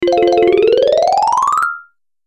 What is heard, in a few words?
Cartoon